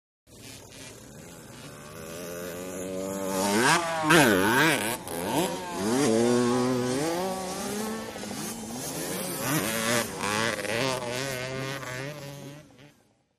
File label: Motorcycle Motocross; By 125 Cc ( Several ), Cu Fast Bys R / L, Shot Cu.